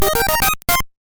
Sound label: Balloon Combo; Balloon Kid; gb